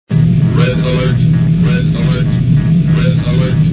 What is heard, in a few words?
red alert; star trek the motion picture